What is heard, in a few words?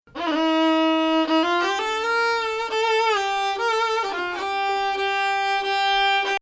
Nahawand